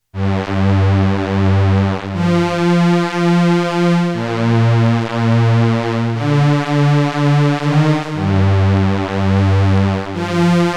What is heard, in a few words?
Behringer Pro 800 Poly Mod Strings